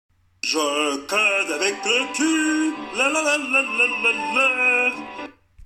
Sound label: lalala